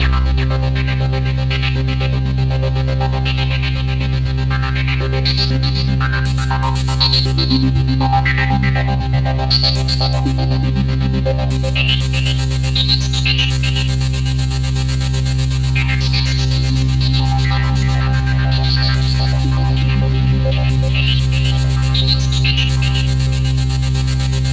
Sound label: Roland JV 2080, poly saw